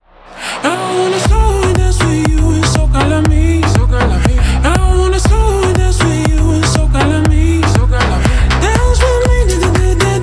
slow dance

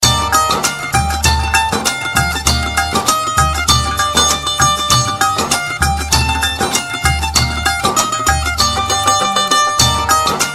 indian guitar

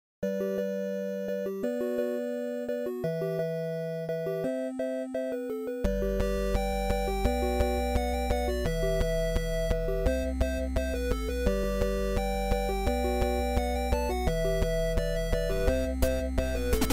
8 bit

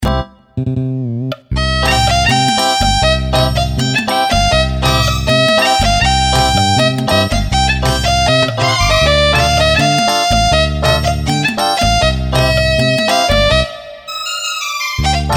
arabic nokia tone

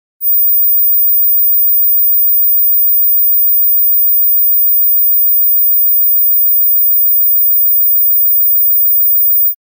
mosquito sound

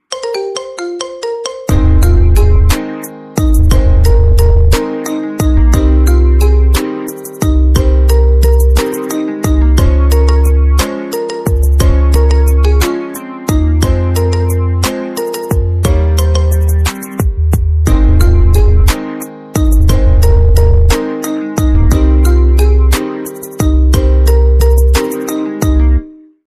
marimba

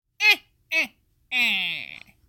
laugh; grinch

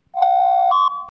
doorbell; star trek

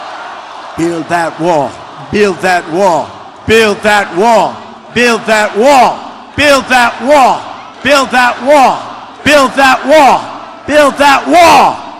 wall chant

